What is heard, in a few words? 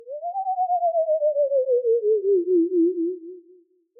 tone; Halloween; seasonal